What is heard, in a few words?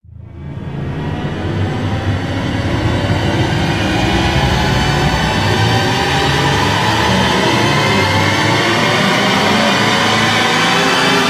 Piercing Rise; Ninja Tracks